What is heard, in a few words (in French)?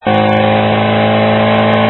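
Midget 2000 tours minute, Midget 1275cc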